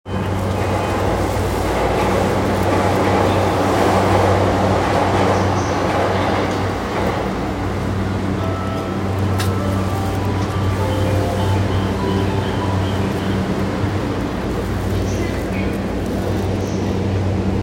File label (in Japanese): JR山手線目白CCW